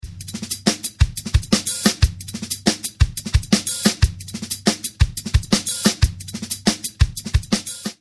Triple The hi-hats play a 1-2-3 1-2-3 pattern over this busy rhythm